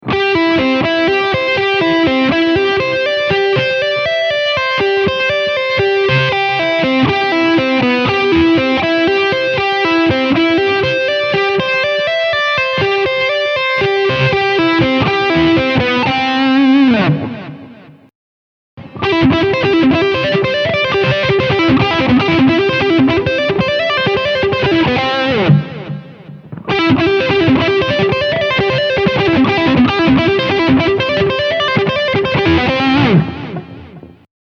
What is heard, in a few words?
string skipping Do